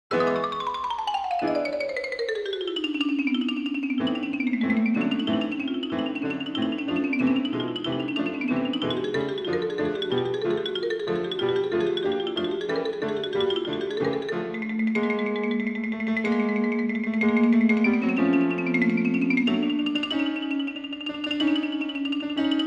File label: Fast; Flight Of The Bumblebee